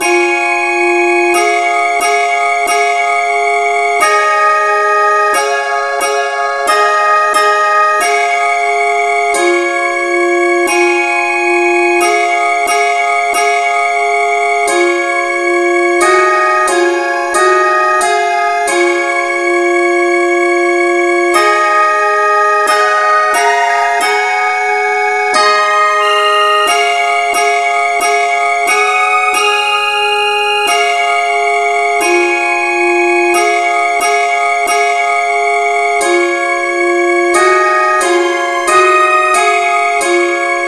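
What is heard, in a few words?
no vocal key C sharp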